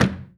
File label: percussion one shot lid big